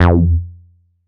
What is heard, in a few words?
bass classic F; Polyend; ARC Noise